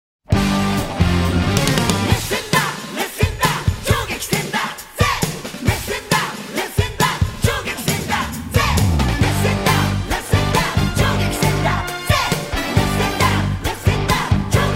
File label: Burning Fight - A Close, Intense, Super-Fierce Battle; Dragon Ball Z Complete BGM Collection